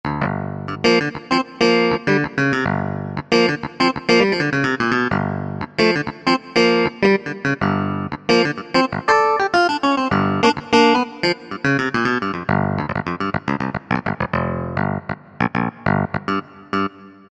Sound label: Clavinet; Sonic Reality Modern Songwriter